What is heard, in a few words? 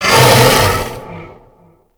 Infested Bone Blade Vocal Idle A; Warframe